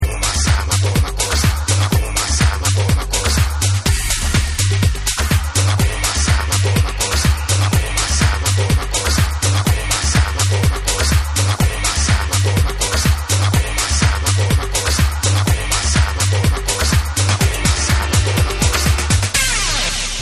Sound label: SOUL, Afro Groove Dub Mix